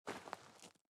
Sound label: step gravel; gamerip; Out of Reach: Treasure Royale